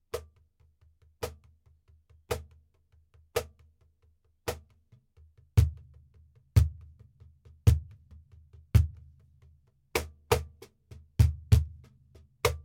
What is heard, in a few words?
cajon flamenco; negro; mosaico